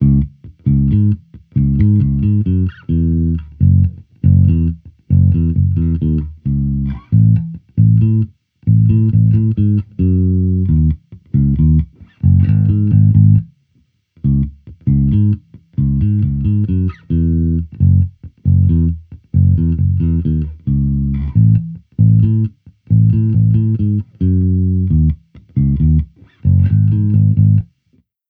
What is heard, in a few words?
Amped loop Azure 135 Dbm, Electric Bass Loops; Slate Digital BASSHOLE Sample Pack